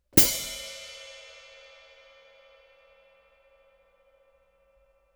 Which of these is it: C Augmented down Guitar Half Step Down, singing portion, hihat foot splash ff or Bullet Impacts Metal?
hihat foot splash ff